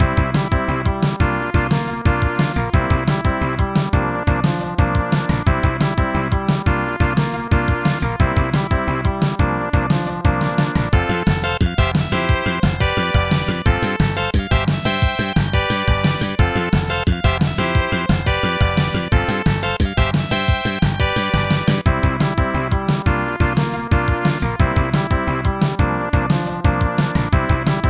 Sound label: Victory!; pro sport hockey 1992; snes